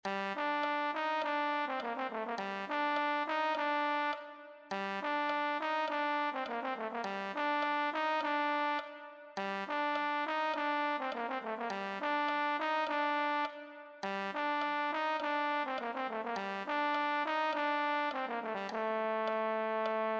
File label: euphonium